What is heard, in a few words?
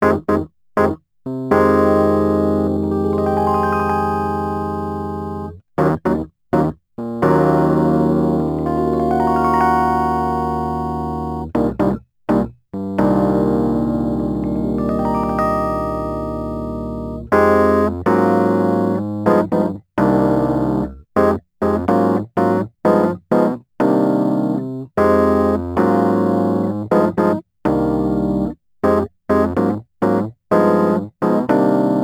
Rhodes Attacks; Ueberschall